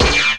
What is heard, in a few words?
BLAST; East West; Global Sounds